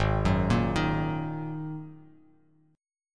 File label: Ab 7th Suspended 2nd Ab, Bass Standard; Ab7sus2 arpeggiated; 4,9,8,8